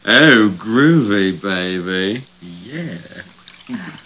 Groovy Baby

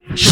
BeatBox Reverse Snar

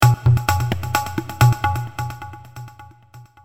Indian Tabla

